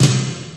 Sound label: eighties round snare sound c key; tuned drums c key